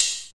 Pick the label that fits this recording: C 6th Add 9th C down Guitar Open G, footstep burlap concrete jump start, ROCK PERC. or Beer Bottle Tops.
ROCK PERC.